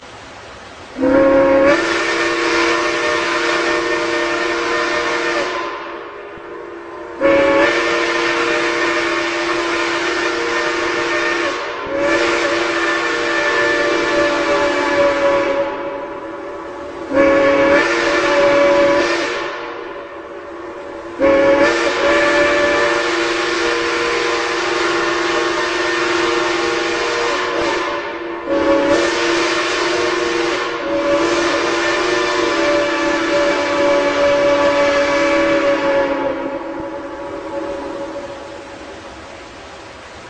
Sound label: horn